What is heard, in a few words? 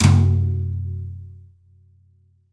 New Brush Flam Tom, Roland BK-5